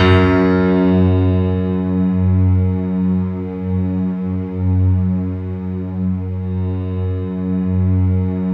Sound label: F#2, Synth Line